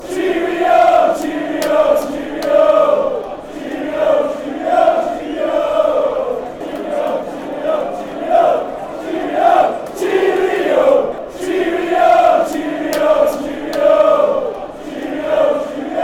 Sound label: cheerio swansea fanchants